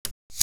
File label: window open